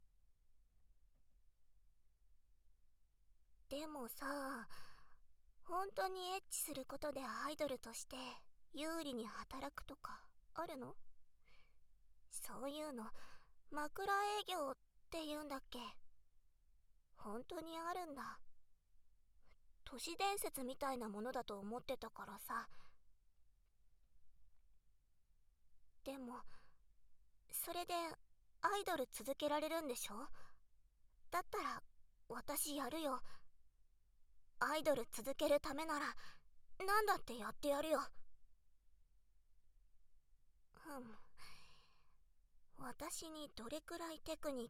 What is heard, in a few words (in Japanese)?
ディープキス オナニー パイズリ フェラ, ASMR; SEなし